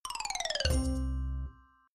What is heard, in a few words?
game lose effect